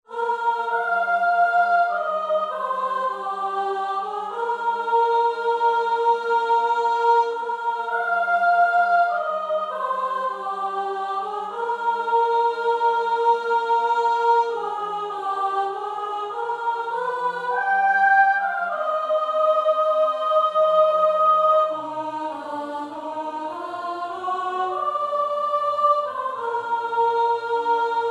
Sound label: Waltz Sop